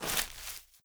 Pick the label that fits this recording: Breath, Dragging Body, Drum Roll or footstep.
footstep